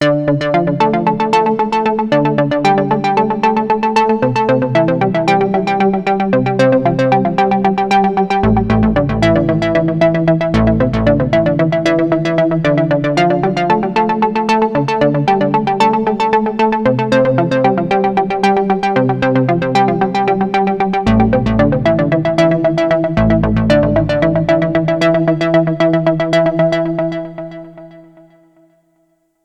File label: Sequential; Sequential Take5